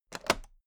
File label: toolbox latch open heavy